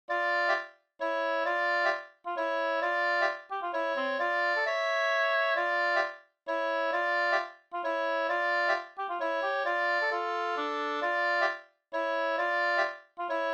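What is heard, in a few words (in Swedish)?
polska, tvåstämmig; Dybeck Runa1845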